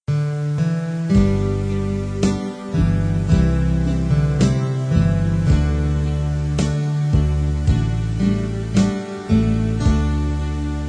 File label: ALTO